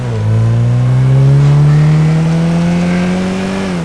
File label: second, vehicles; gmod; tdmcars; gtav; pol sedan